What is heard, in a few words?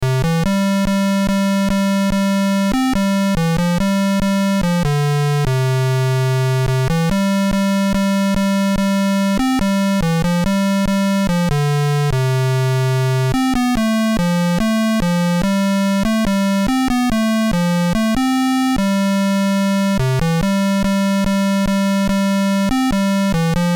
Marine's Hymn, Player Piano; BASIC Manual